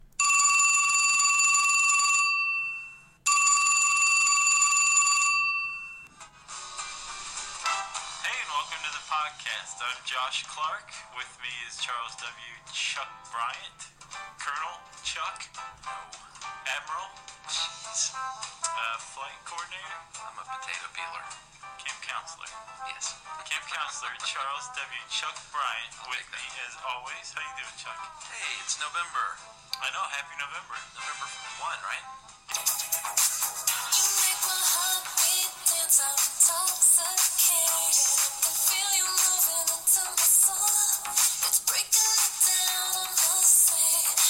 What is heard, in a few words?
Xperia arc loudspeaker xloud